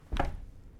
Wall Hit

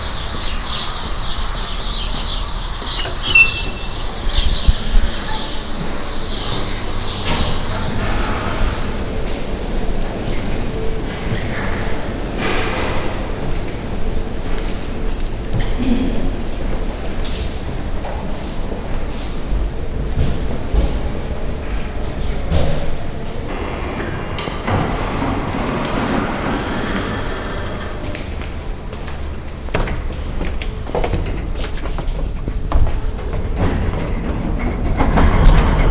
Coming Into A Hospital In Saturday

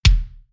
Metal Rock Kick Sample

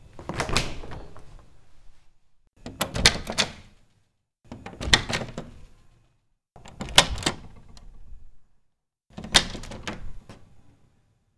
Door Opening

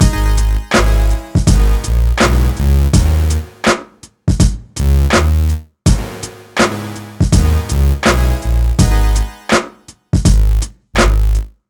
Slo Motion Bpm 82

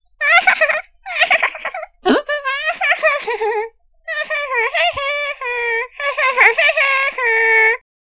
Hahaha

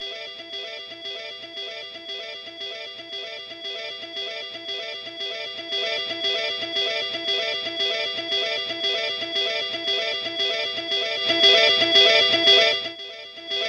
Close

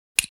Click

